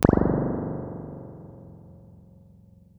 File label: Spring